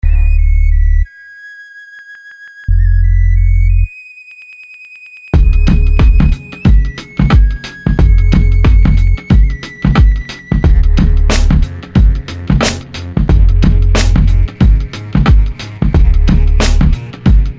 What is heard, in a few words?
synthetic